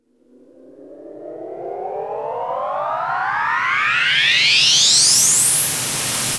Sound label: YAW SWISH, Trance; ILIO; Trance Fusion; STOP LONG